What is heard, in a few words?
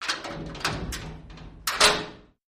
Cell Door Close Cell D